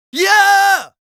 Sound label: Yells, Viking Vocal